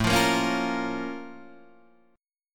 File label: A sharp Minor Major 7th